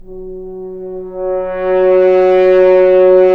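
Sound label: french horns, swells; Roland L-CDX-03 Disk 2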